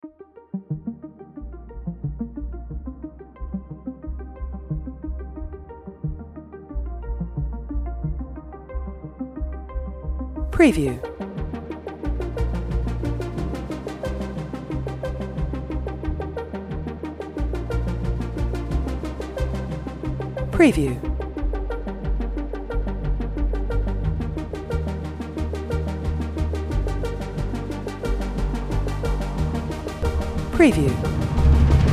Sci-Fi Synths Experimental Cinematic Arps Cyberpunk Soundtrack